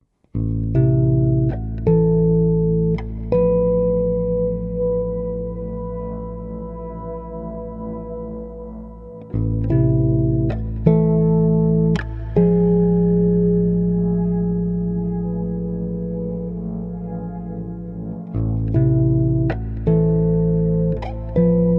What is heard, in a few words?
Glowing Dark